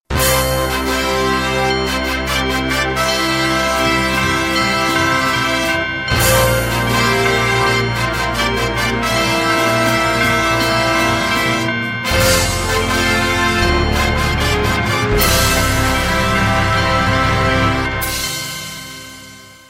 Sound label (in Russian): Фанфары для награждения